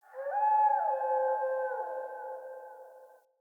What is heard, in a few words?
Wolf Cub Landscape Howl; Vocals; Animals